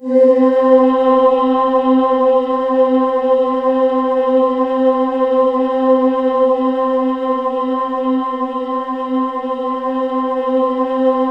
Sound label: C4, Synth Leads